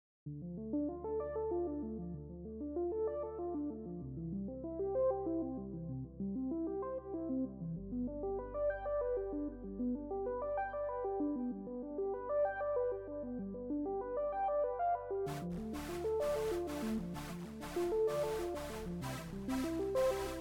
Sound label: piano and synth